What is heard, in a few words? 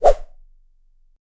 sword whoosh; swing